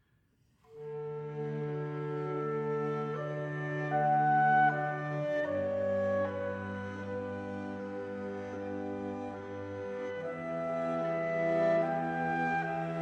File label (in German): Larghetto Ausschnitt, Quintett; KV581